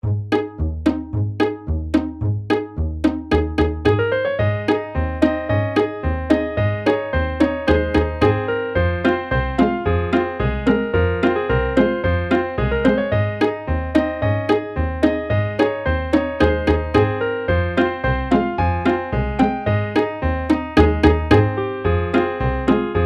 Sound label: Air pizz; Higurashi no Naku Koro ni